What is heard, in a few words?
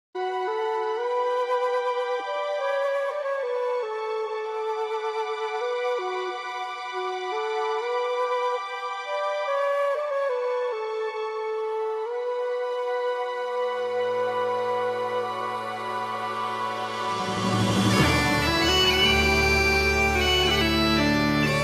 Bagpipes; ساز بادی اسکاتلندی